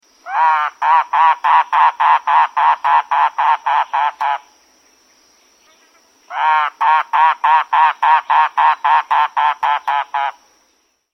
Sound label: Mangrove Cuckoo, songs